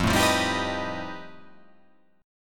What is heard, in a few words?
F 9th Flat 5th F down